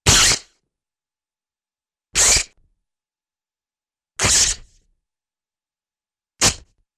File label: Quick Short Record Scratch-like Rips, cartoon; Hollywood Edge; Cartoon Trax